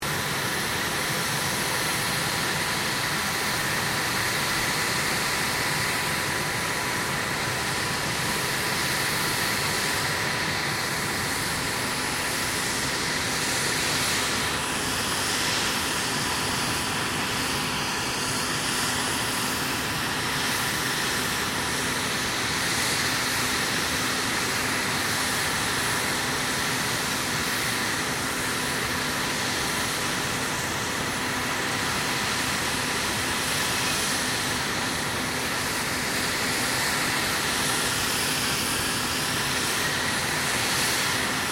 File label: HELICOPTER SIKORSKY UH60 BLACKHAWK TURBINE IDLE LOOP, Australian Army Blackhawk